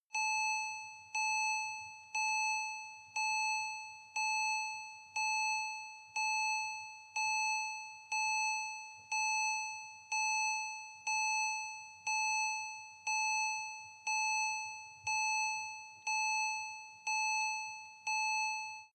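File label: sports car interior beep alert; verkehr transport; autos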